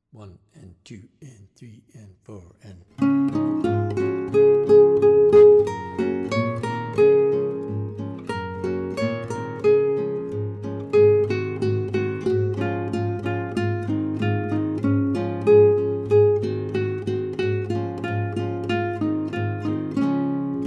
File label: in Db, guitar; Song of the Wind